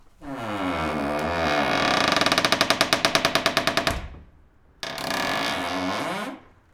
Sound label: old creaky door open and close slowly